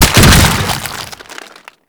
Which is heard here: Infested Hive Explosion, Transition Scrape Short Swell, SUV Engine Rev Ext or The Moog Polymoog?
Infested Hive Explosion